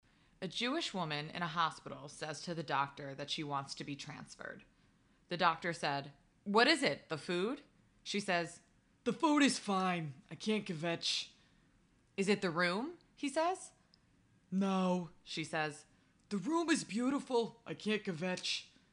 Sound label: Laugh Track; Bob Mancoff; Joke